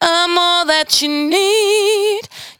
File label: 92bpm Bb, Velvet Vocal; Zero-G